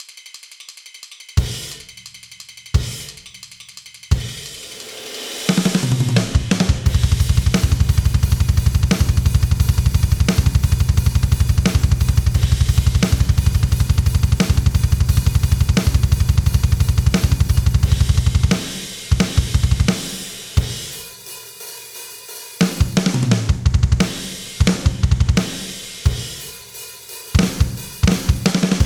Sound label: Drums